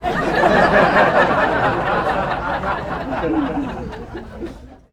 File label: Crowd Laughing; 1001 Sound Effects